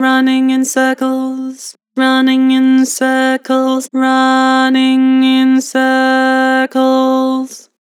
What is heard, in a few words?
Flat Running in circles, Harmor Vocals